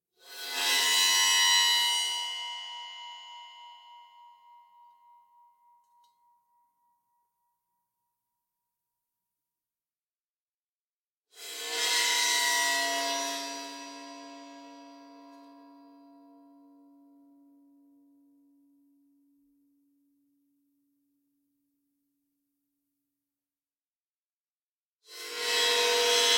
Instrument Cymbal Bowing Med Pitch Ringing OmniMic; Guild Wars 2 (Original Foley Soundtrack)